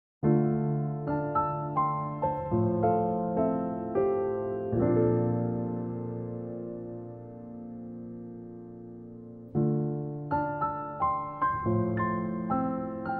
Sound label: contemporary piano sample